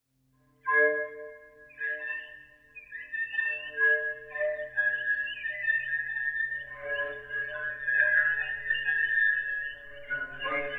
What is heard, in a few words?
Squeak